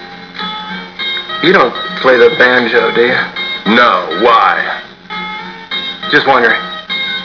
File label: banjo